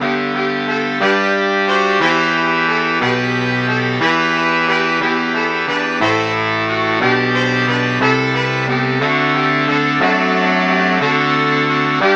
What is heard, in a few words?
baritone sax